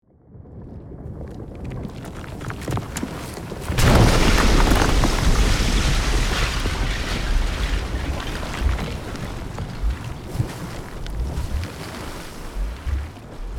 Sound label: Volcano lava eruption explosion debris; nature